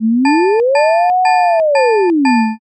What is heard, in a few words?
firetruck